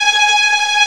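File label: tremolo viola; E-MU Formula 4000 Series Vol. 5; Protozoa; Proteus2 Presets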